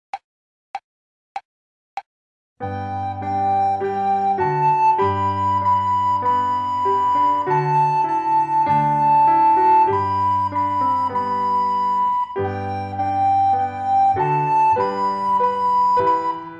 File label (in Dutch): Begeleiding blokfluit Mieke heeft een lammetje